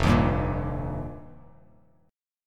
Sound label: F 7th Suspended 2nd Suspended 4th, Bass Standard; F7sus2sus4; strummed; JGuitar